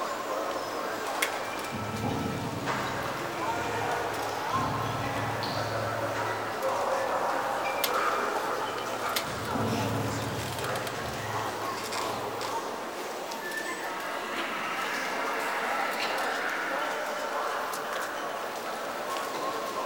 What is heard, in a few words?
Side Room Loop; Warframe; Venus Open World; Venus Solaris United; Exterior